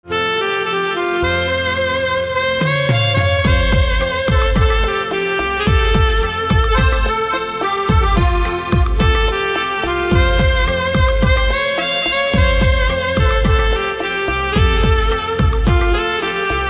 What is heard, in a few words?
Saxophone